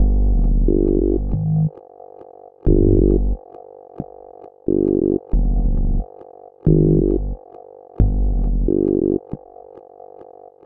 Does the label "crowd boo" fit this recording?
No